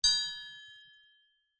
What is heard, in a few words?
musical triangle single hit resonate